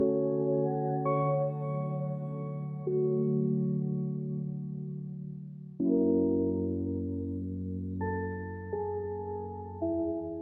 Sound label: focus lofi